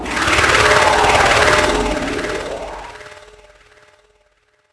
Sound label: Shock Enemy